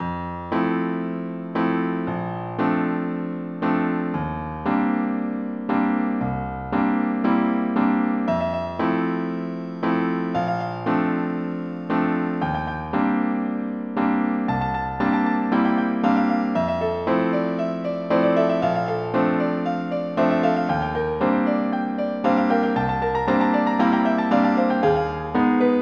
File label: ROMANCE